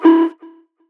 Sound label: Warning Beep Slow